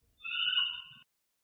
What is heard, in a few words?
NHOW alarm